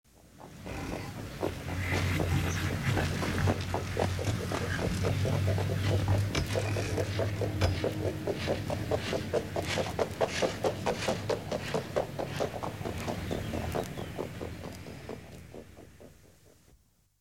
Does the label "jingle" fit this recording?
No